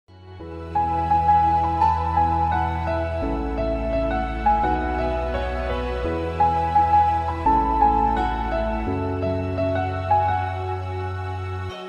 instrumental lofi instrumental flute ringtone

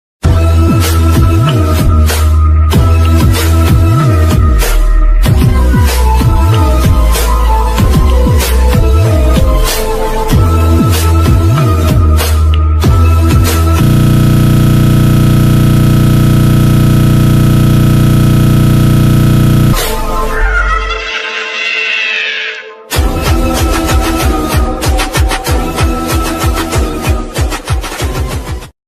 attitude ringtone bgm ringtones english ringtone

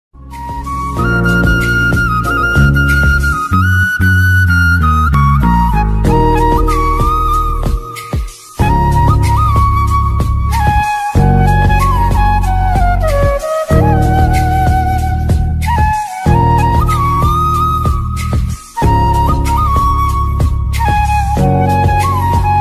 best flute music ringtone

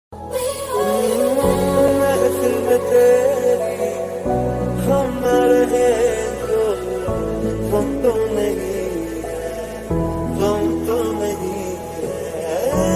lofi remix ringtone

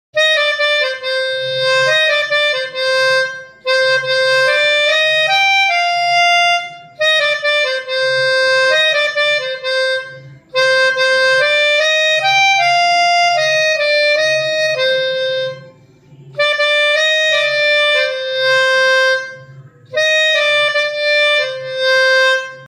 short melodica cover

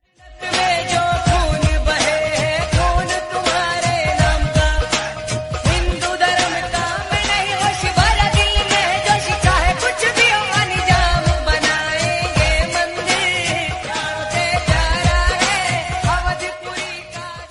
song devotional ringtone; ram navami

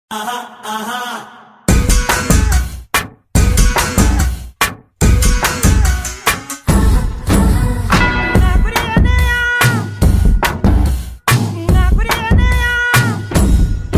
tamil popular song ringtone tamil bgm ringtone; Enthiran

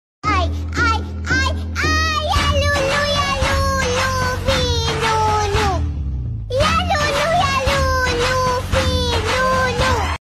cute baby notification bgm ringtone sms tone

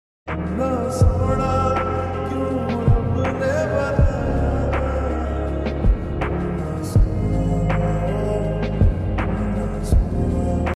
heart touching ringtone slowed reverb

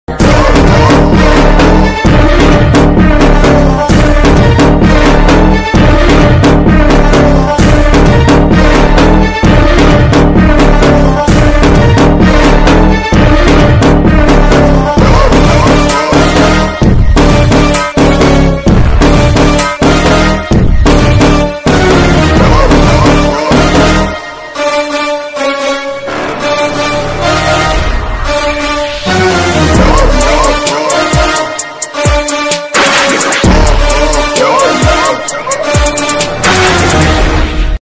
interval bgm ringtone

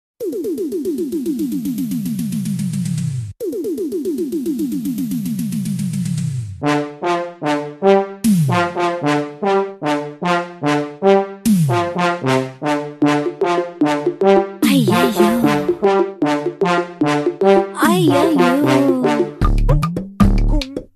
romantic ringtone; rani mukherjee; prithviraj sukumaran

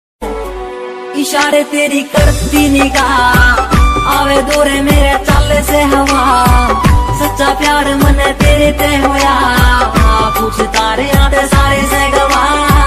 haryanvi song ringtone

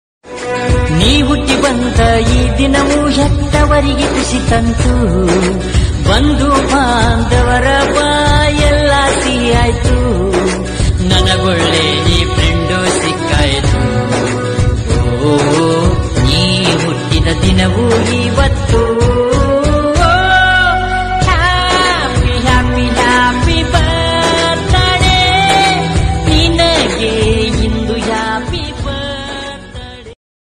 happy birthday song in kannada

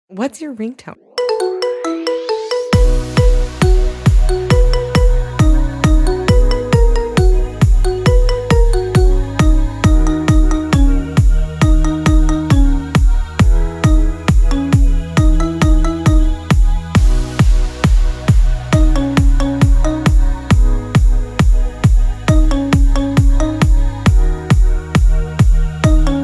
ringtone marimba remix ringtone

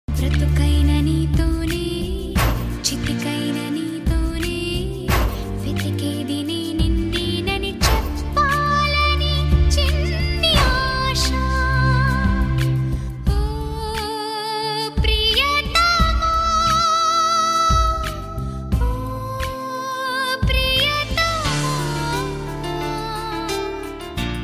telugu love songs

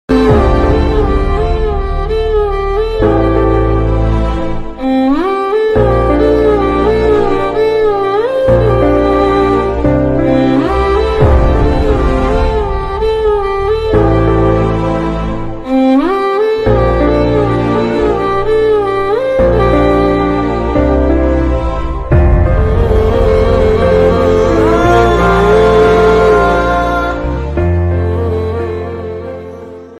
bgm emotional sad bgm south bgm ringtone; jersey

